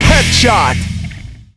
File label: headshot